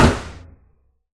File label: bullet impact wood